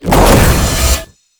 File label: Cast Riser; Warframe; Uriel; Demonium